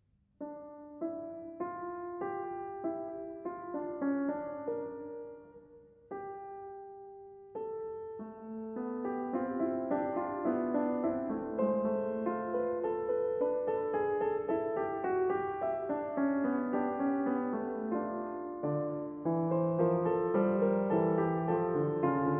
Prelude & Fugue in D Minor, BWV 851 II. Fugue

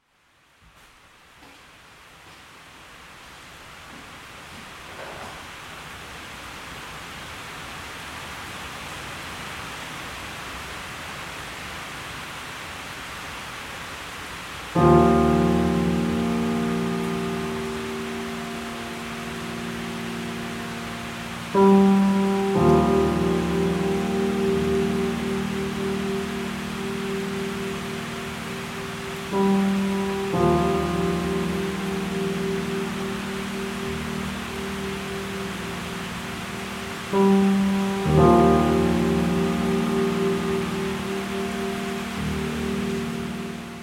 Sudden Thunderstorm

